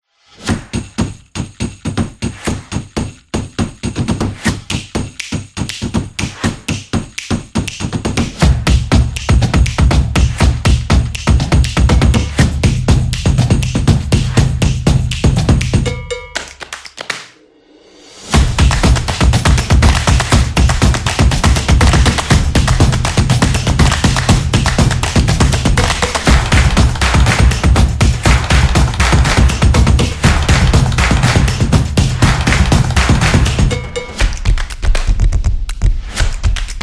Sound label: Dynamic Groove; casual game